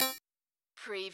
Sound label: bandpass